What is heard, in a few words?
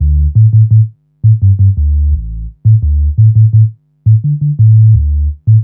Sub Bass, Drum & Bass; Fluid Dynamics